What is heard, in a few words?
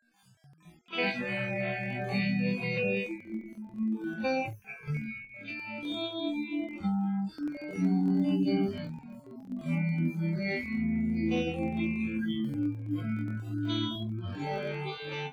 guitar; sound source separation methods